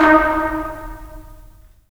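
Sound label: brass trumpets staccato, left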